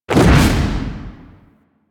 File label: Reaper Jump; Warframe; Wraith